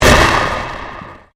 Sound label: Convolution Pulse